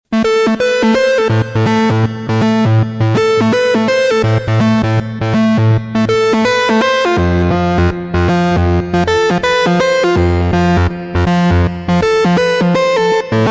VST THE MONO LEAD DANCE

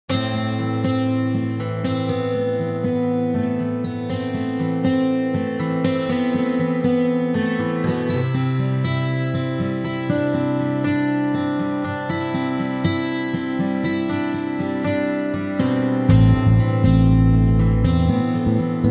SAMPLETANK GUITAR

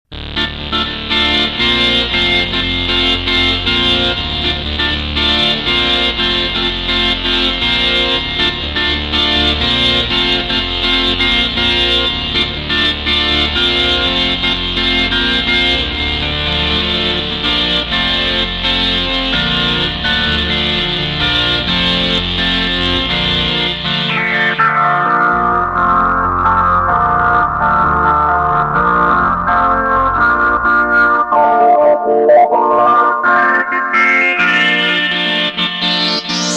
Acid Cutoff

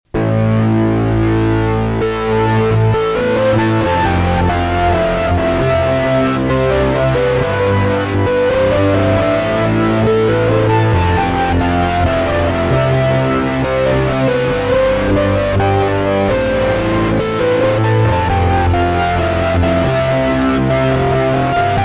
GRUNGE

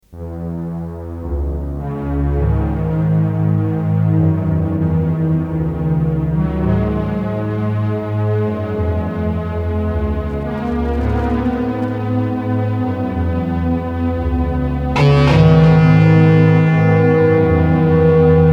PAD

